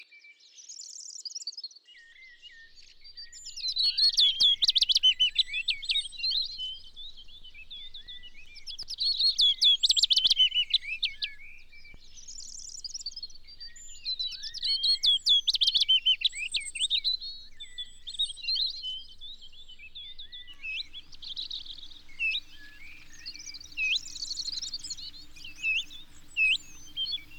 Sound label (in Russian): penochka vesnichka